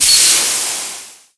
spore cloud, weapons

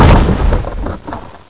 wall; ParoxysmII; ambience

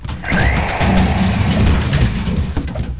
debris; nzp; nzportable

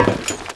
step

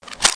slap, mp5k; nzp

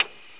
navigate

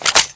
bolt, m1carbine; weapons

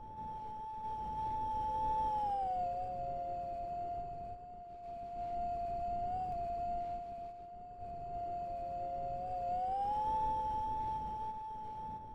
moan; q3rally; world

